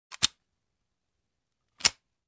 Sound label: Reloading machine gun